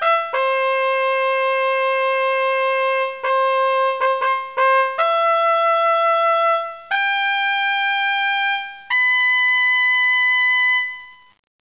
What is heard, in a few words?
kaval